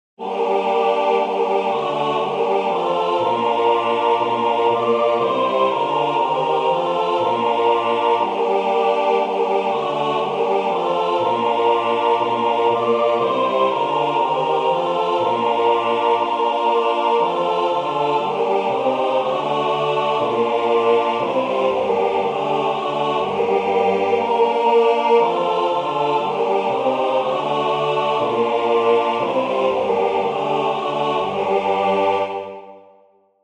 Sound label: tutti; belle qui tiens ma vie